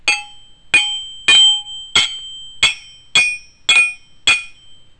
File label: bars